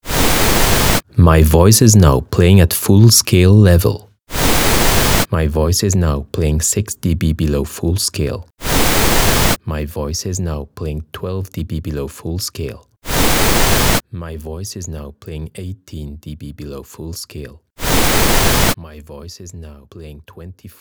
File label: dynamic check